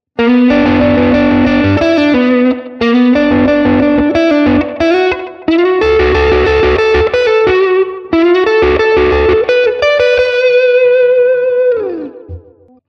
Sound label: Lick